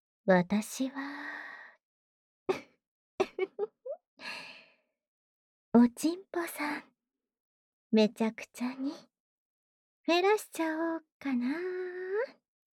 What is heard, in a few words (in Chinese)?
妖豔 深喉口交